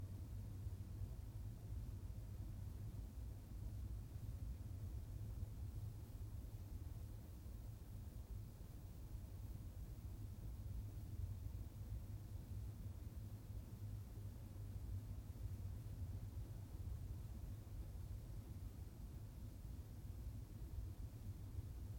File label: atmo loop